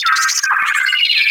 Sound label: Advert Bot Chatter; Ambience; Props; Corpus Ship